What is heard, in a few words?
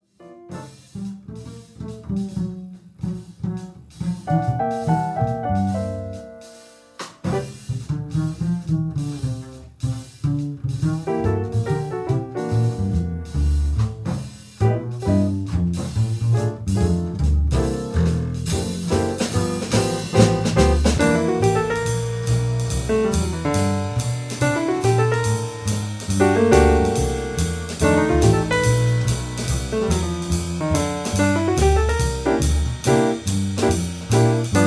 Blues